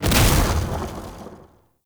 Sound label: Hunter Sniper Shot